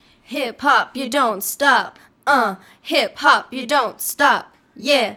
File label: Female Vocal; Sonic Foundry (Sony Creative Software); Mac Money